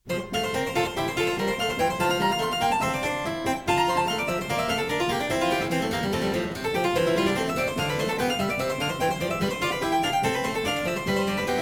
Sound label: Suite in F Major - Allegro, Harpsichord; Suite No. 2; HWV 427; Handel; Suites for Harpsichord